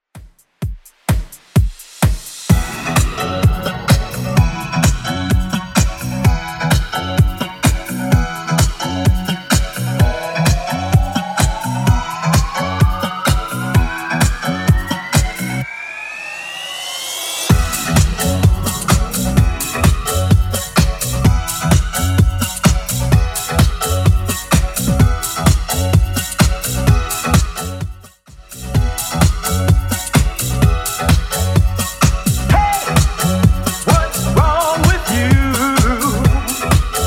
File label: 90s Redrum 128bpm